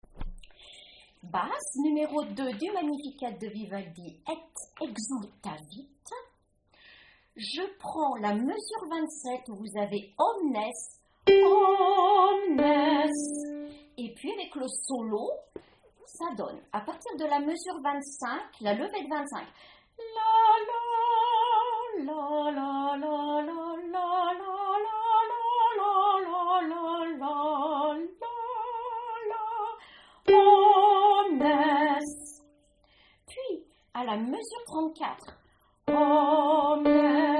Magnificat Basse; Vivaldi

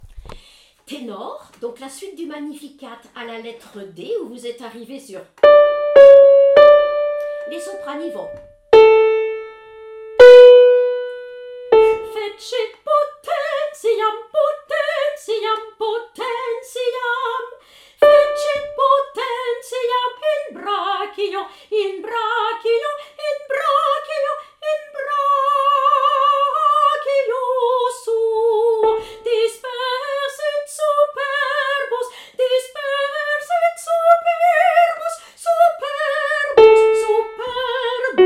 Magnificat Tenor; Pachelbel

